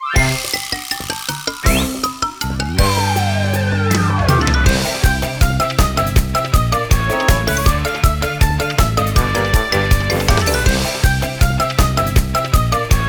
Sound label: Jingle Shame (Placed Poorly); Super Monkey Ball Banana Blitz; Jingle Zannen